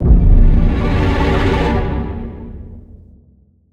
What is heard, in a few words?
Suspenseful Ident; soundscrate